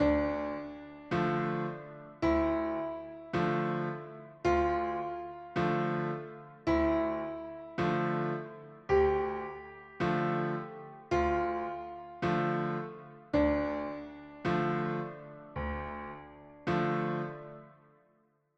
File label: a low tense slow half step motion